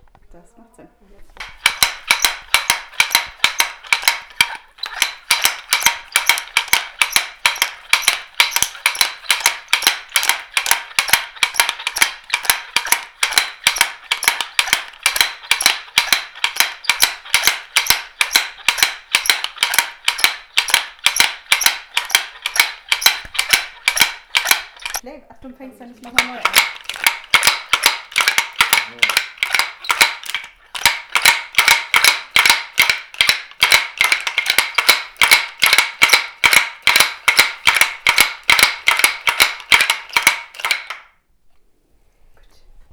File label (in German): klappern; soundscapes